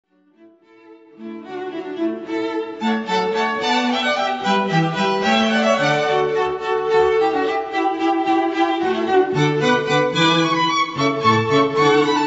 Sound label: Hornpipe; Handel